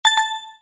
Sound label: bomb